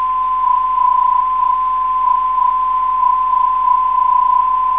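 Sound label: 371kHz, non-directional beacon; RIV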